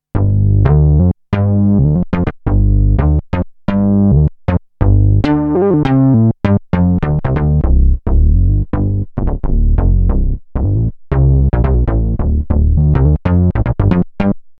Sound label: Mini Bass